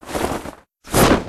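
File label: reveal object; breakdown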